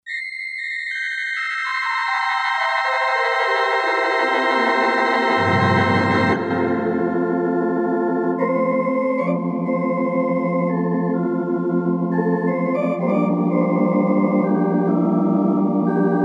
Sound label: THEATER ORG, Roland SC-880; Factory Patches